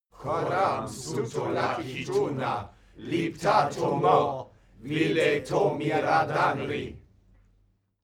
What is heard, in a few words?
male crowd evoke